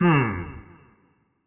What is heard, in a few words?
Grunt; Marv